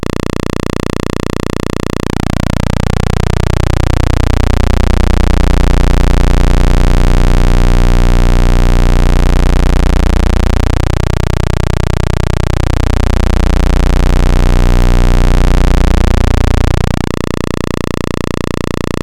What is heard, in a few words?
SID PWM